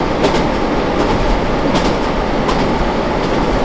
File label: Run, Train; OpenBVE; Cl313 Silverlink